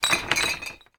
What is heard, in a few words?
bottle collide